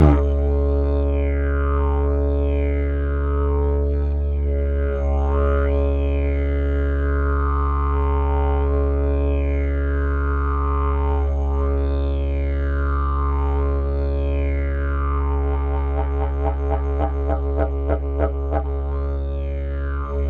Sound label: Long Didgeridoo; Digiffects Sound Effects Library